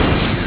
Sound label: close door